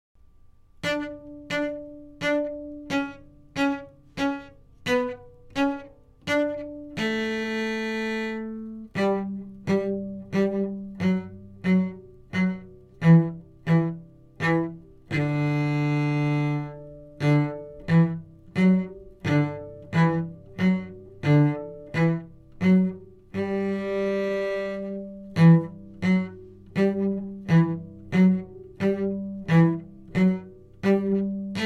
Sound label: French stacc Cello